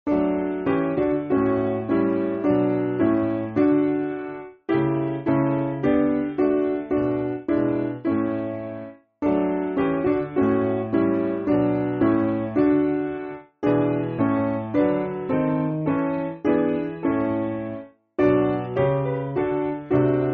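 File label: Piano, Music Backing Files for Churches; Bread Of Heaven; Conder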